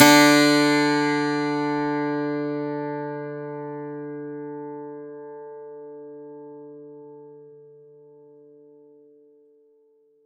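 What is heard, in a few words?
Plucked 12 string D3; Acoustic and Electric; Polyend; Simulacrum Sounds; 1 Shots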